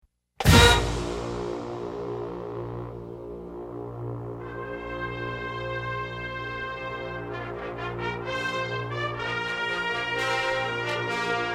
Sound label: fanfare